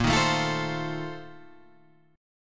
Bb Major 7th Suspended 2nd Suspended 4th, Guitar, Open G